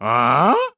Uhh; Gondo: "Uhh?"; Skyward Sword

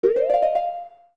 Pause Menu Open; Open the pause menu; OOT

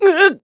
Fenced In; (at the Temple of Time's bridge); Link fenced-in by Ghirahim; Skyward Sword

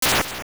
Sword Electricity; Electricity heard while the L2 Sword is forged (repeats 52 times - 7.5 times per second)

